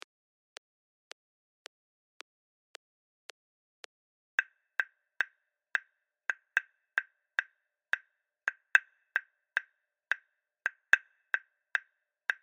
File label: Gahu 110bpm; Afrika